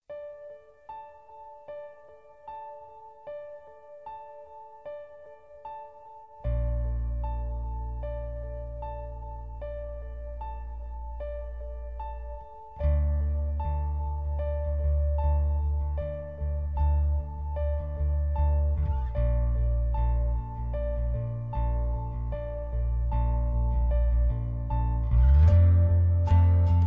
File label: Escape music